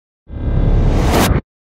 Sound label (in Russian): perehod kiberpank